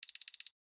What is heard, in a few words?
Timer Beep, alarms; Huawei P30